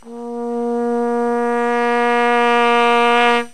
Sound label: crescendo, brass